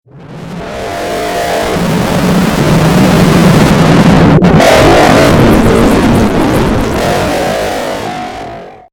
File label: ping ring